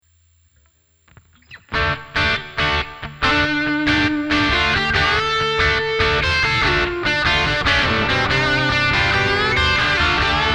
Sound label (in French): Un petit mixage, avec reverb, compression et EQ; Amplug Classic